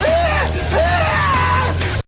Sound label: Screaming Death Trap; Earrape; Loud Earrape